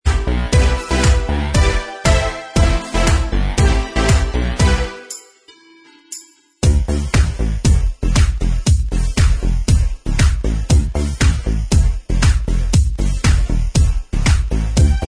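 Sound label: instrumental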